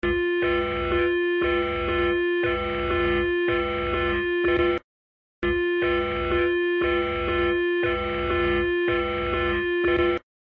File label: ukrainian eas alarm